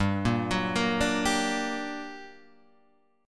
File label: G Minor Major 7th, down, Guitar, Open G